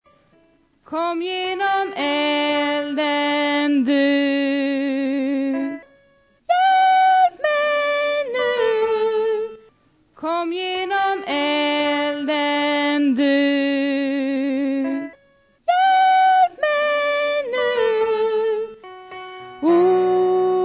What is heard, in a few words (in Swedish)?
kom genom eld bas